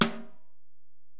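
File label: Acoustic Snare, Alesis DM-PRO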